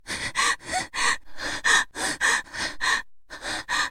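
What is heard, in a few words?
woman frightened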